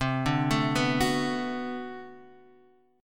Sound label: B-Minor 7th C; Bmin7